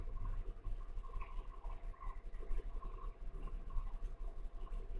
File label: Dry Storage EVP